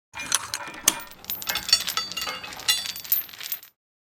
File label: Bicycle chain lock unlocking